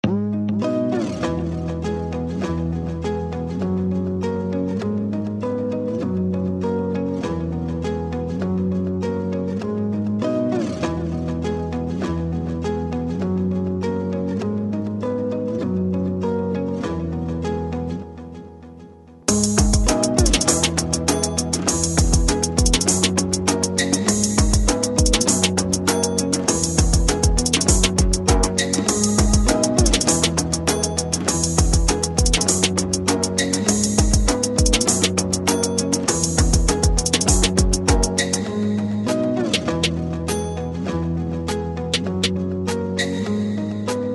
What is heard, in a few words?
urban